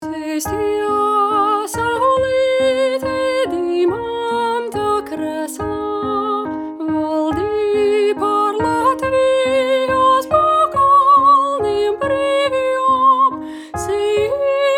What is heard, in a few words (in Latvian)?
Saulite 5-9 un 5-12 klasu kora 1. Balss; apguves repertuars